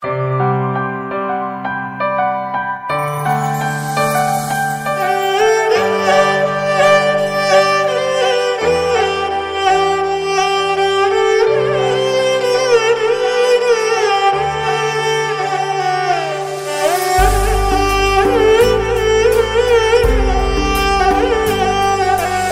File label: Violin